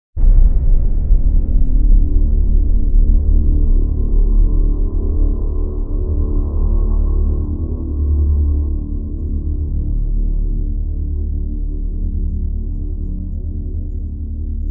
Boom Drone; Epic Tools vol. 1

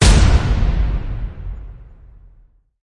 Impale Hit Epic; Ninja Tracks

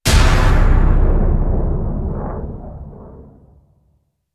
Darkshot Hit Epic; Ninja Tracks

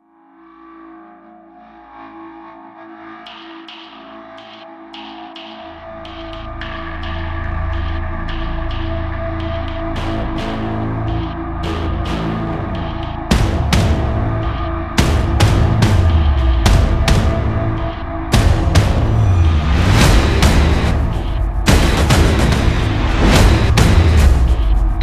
No Orchestra, No Guitars; Ninja Tracks

